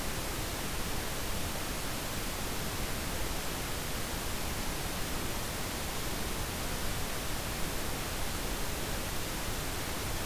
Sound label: pink noise, 48kHz